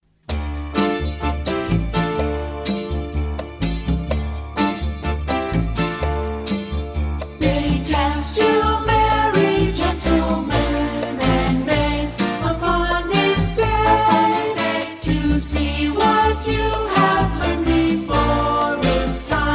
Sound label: they test you merry gentlemen vocal 1 step down